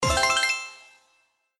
Symbol High Pay Win; slots; Realm Saga

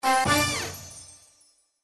Symbol Scatter Bonus; slots; Wildlife Adventure

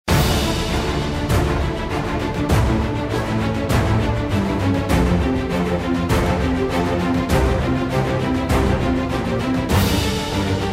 BGM; Fortune of Olympus

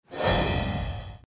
BONUS COIN DECISION; slots; Magic Coin

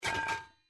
Pot Animation, slots; Panda Majesty2

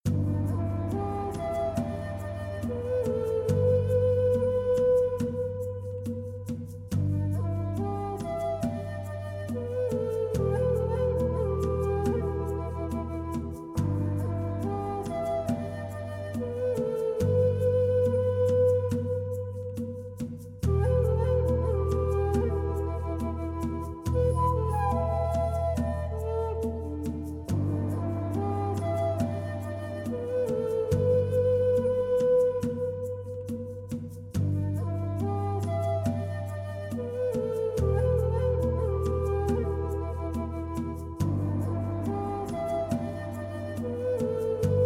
Music

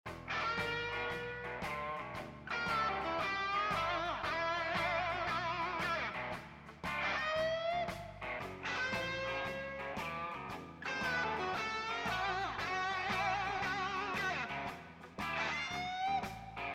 BGM Paytable, slots; Big Paul

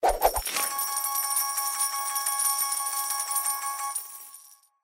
BGM Jackpot; Kungfu Showdown

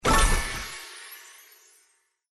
reveal; Magic Number; Outdoor America

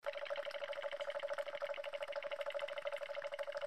reel spin; Magic Coin

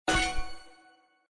Chest Click; slots; Realm Saga

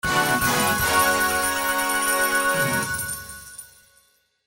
EFX JACKPOT ANIM, SLOTS; FruitFiesta

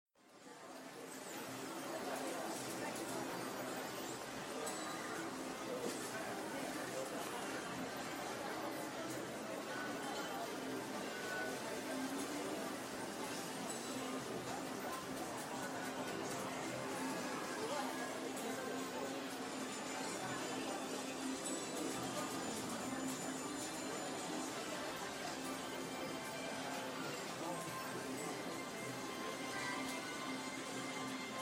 Music, Roulette

